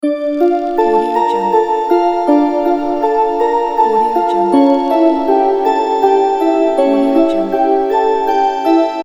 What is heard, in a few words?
creepy game music loop